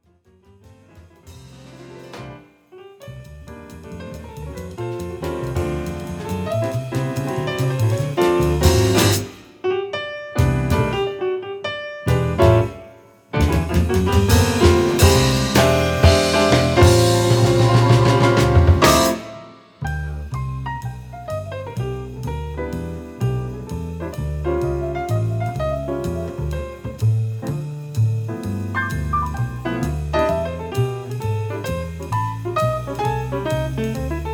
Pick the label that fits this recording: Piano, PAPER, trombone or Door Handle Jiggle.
Piano